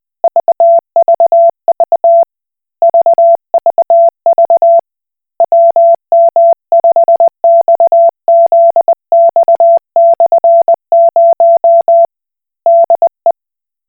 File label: Koch Morse